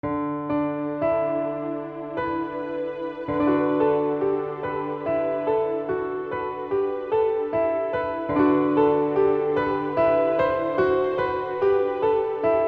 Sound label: Mothers Evening Prayer Study Med